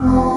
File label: F STAB, funky